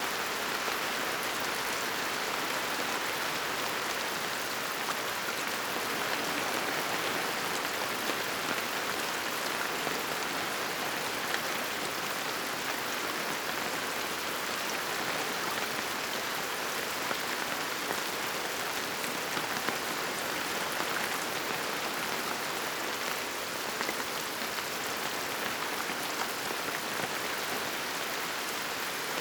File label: Rain White Noise